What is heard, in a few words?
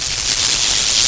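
Electric Eel; Finding Nemo; XBOX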